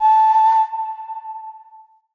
Flute A; Player Instrument

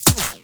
Shield Impact

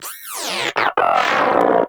Inquisitive Vocal Death; Pets; Warframe; Moa

